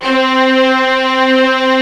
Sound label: HALL STRINGS, EMU; Rubber Chicken Software Sample Bank